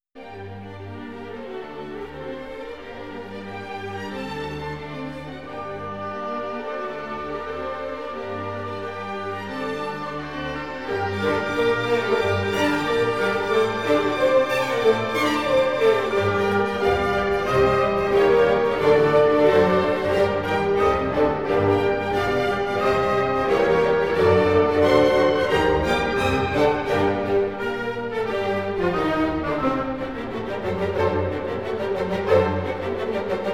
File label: Symphony No. 25 in G minor fourth movement; Mozart; Legend of Galactic Heroes; Galactic Empire SIDE